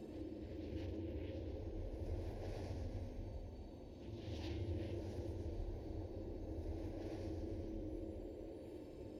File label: loot portal open idle